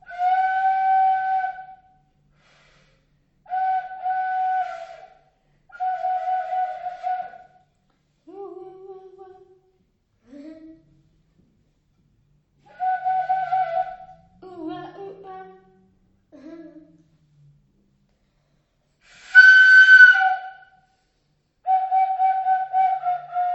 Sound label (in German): floetensequenz; malte; Natur und Musik